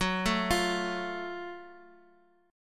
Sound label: F sharp Minor Major 7th, Guitar, Open D; chordshape; down